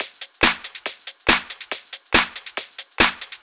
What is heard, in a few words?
Addon Loop, Drum Loops 140bpm, Perc Loops; Trance; Zero-G Trance Inducer; 90 s Sample CDs